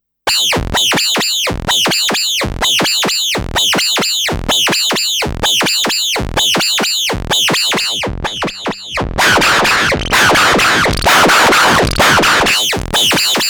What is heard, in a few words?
MS-20 vs TS-303